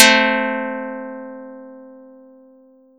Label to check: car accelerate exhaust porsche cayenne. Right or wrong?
Wrong